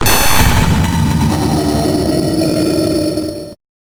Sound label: red static screen sound; zerosiki; slender